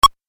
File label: Retro Pong Tennis Hit; Video Game Sound Effects